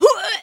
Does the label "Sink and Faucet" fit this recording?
No